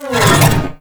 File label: Drone Retract; Grineer; Weapons